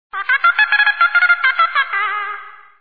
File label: bat empty; MRSHot2003